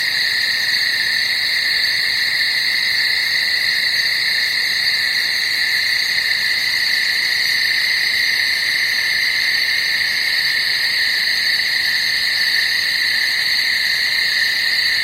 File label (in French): Campagne nuit